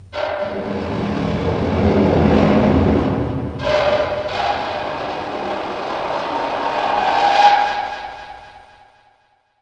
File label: scrap hulk